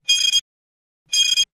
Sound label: Distorted Beeper